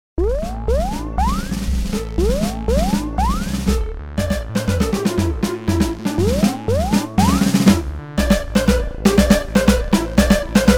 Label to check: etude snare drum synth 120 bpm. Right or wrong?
Right